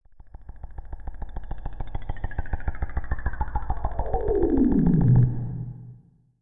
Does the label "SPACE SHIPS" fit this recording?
Yes